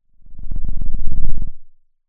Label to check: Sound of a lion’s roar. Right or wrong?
Right